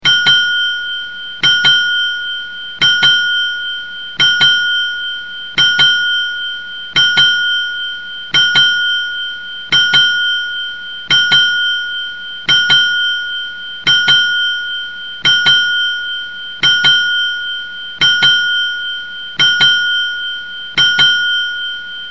叮叮, 钟声; 广播室铃声系统

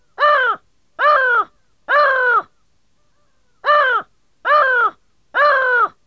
乌鸦

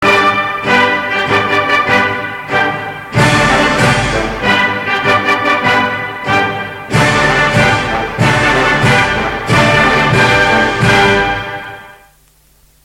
国歌伴奏; 中华人民共和国国歌; 义勇军进行曲

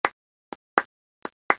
脚步声

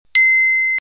叮长

